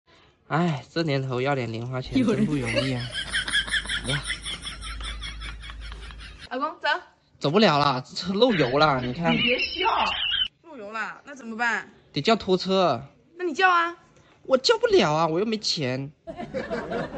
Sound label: laugh; breadradio